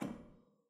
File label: Harpsi Low Rel Far C5, Harpsichord, Flemish; Chordophones; Releases